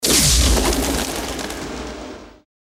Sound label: high voltage